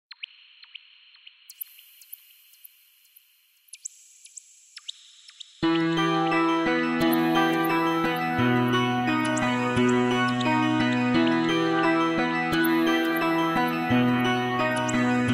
piano

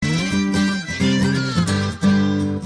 short country riff

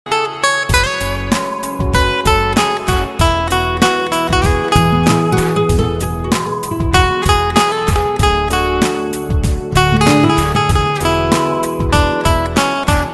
love instrumental

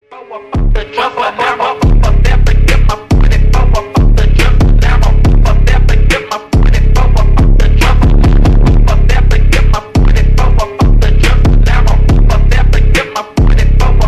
Phonk (Slowed + Reverb)

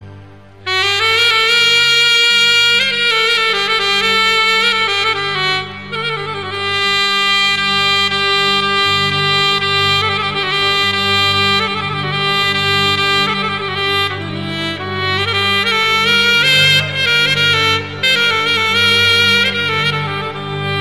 saxophone music

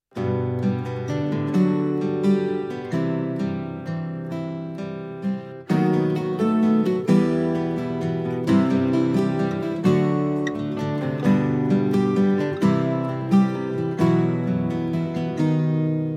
romantic tone

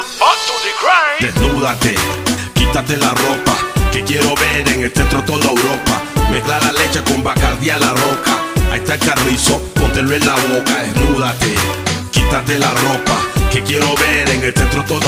panama reggae

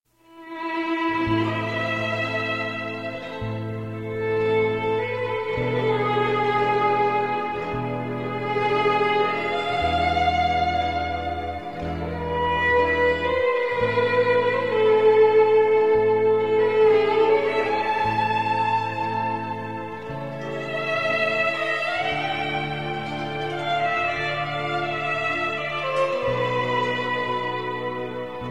gypsy violin

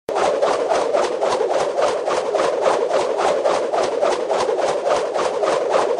whirlwind; world of warcraft

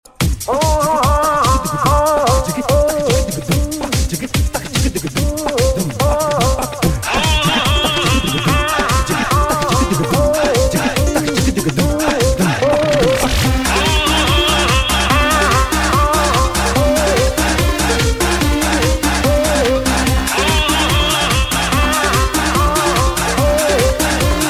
punjabi

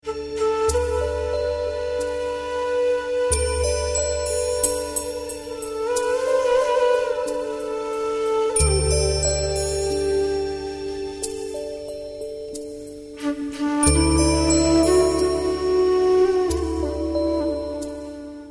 classical flute

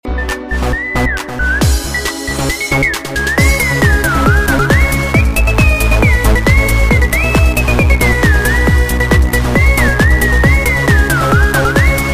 cool trance

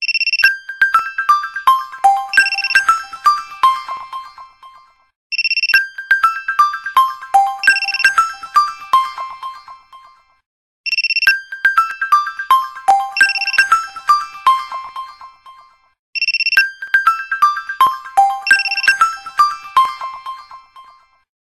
nokia lumia alarm

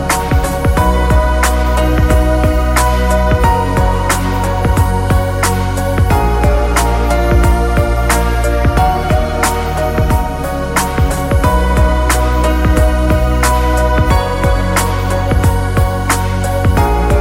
chill mix

